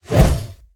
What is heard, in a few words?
weapon swing